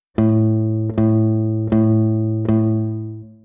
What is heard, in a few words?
quarter note rhythm